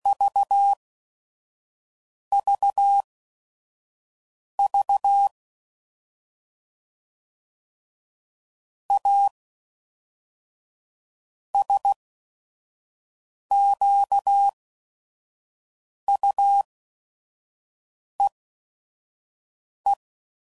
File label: Squeaky, CW Code Groups 5WPM; Sayings; HamRadio; Morse Code; Chuckles